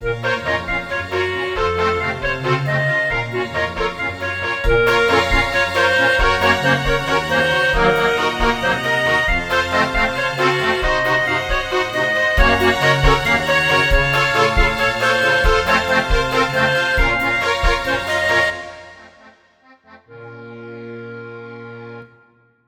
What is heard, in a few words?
balkan brass dash